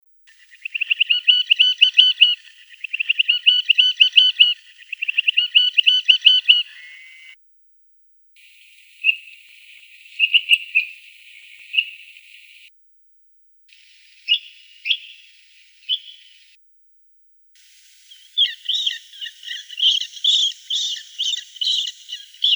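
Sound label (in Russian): Pesochnik morskoy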